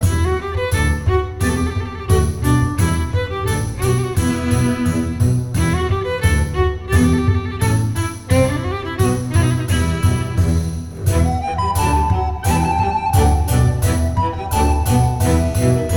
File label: map loop; Adventure Xpress